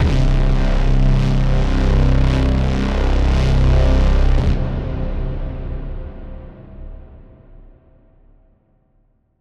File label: epic braam